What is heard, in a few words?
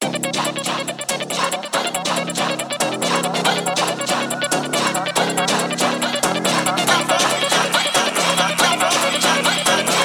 phonk